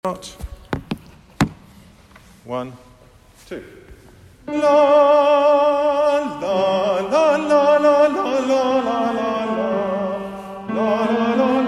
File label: tenors; Canto ultreia